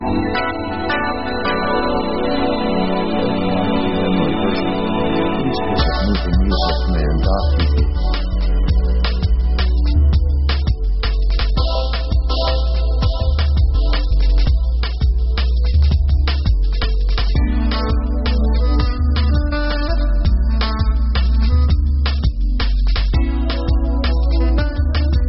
jungle sax; synth themes

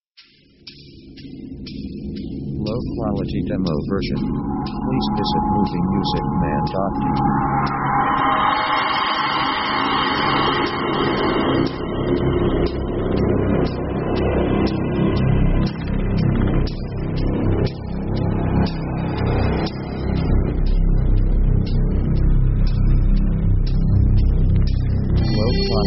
dark power atmosphere; Background themes

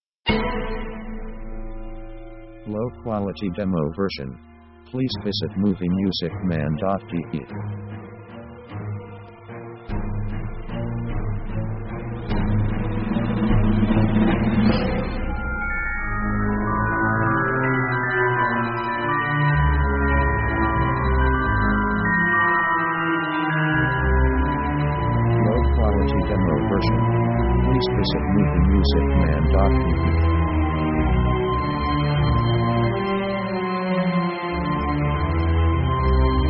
orc dangerous; Dark mood

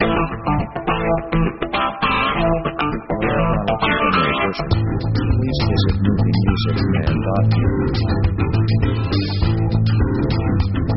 organ rocks; rock themes

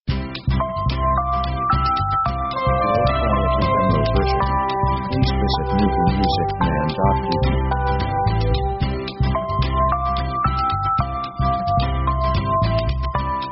pop easy

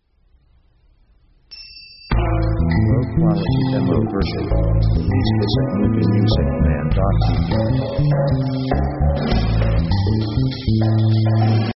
orc action

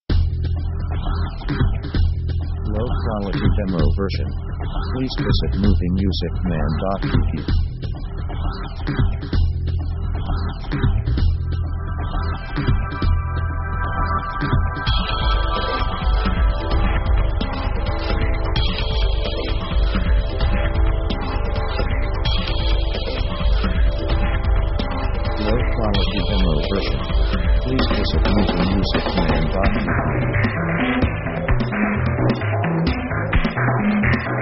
synth weird action; synth themes

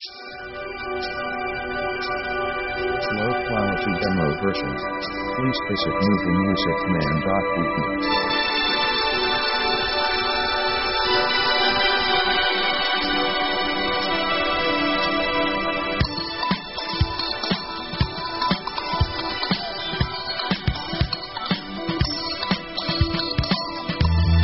funk 120bpm; contemplative themes

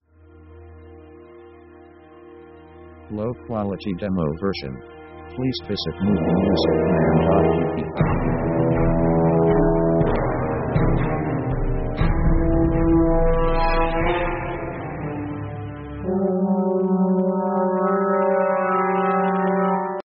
orc action; Dark mood

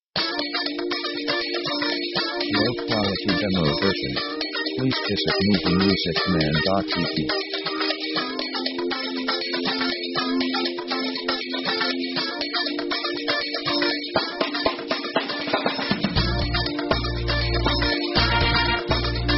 feel good guitar groove

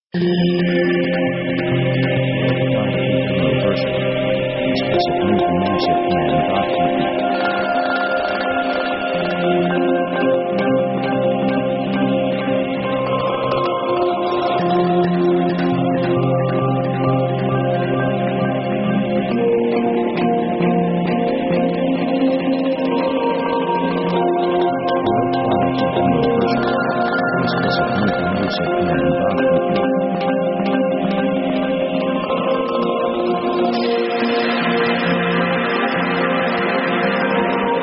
ghost of the machine; synth themes

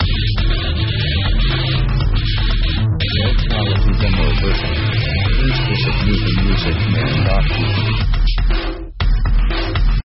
metal; power themes

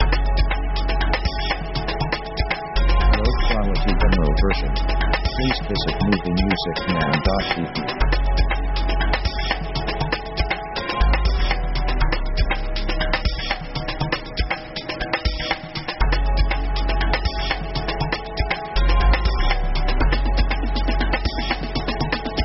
jungle kontemplation, synth

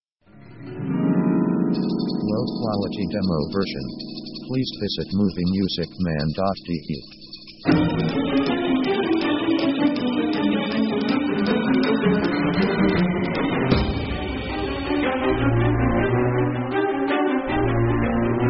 orc thriller action

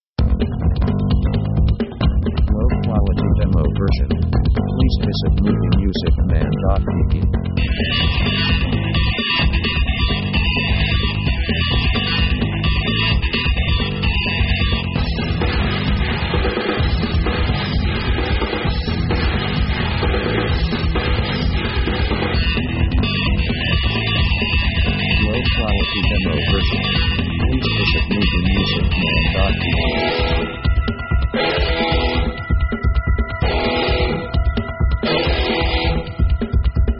rock fast metal action; synth themes

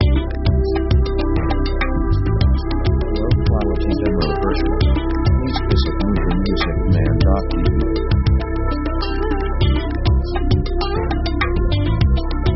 sci fi 70s, synth; synth themes

